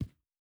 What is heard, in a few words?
Shoe Step Stone Medium